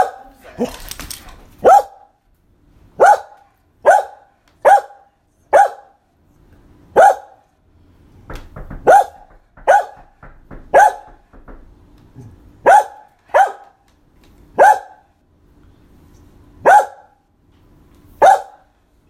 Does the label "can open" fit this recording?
No